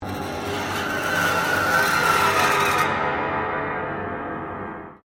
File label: PIANO UPRIGHT BROKEN STRING SCRATCH UP, Upright piano without keyboard; Pianos (Sound Effects:Musical)